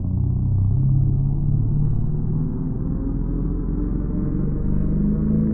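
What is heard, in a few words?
engine accel; firespray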